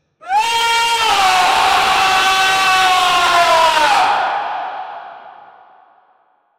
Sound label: demonic scream in hell